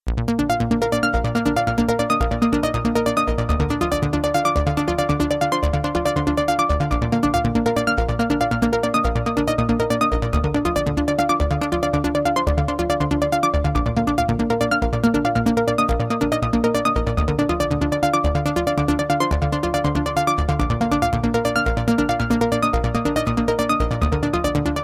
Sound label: Warp Tone